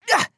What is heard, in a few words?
Grunt Jump